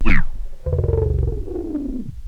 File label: BLAP